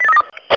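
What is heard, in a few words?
push-to-talk, radio effect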